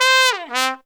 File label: TRUMPET FX; Zero-G; Phantom Horns